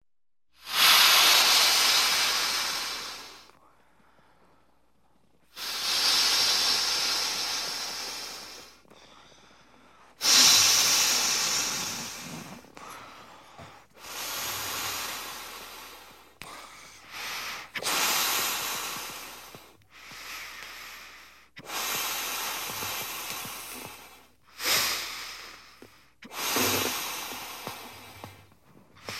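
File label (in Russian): воздушный шарик надувание и завязывание со скрипом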